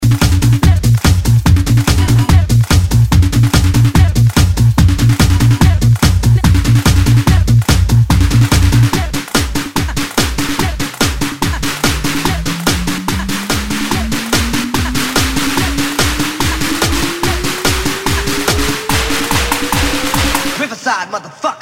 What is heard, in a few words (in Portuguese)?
electro funk e electro dance remix